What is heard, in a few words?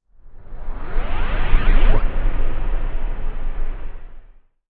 loading effect